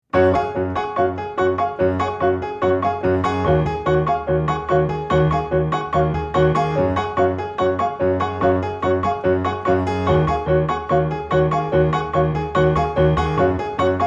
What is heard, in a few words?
Piano solo